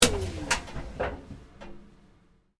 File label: Tumble dryer off, Tumble dryer (domestic): switched off; Laundry Sound Effects; Laundry (Sound Effects:Household); Stuart Duffield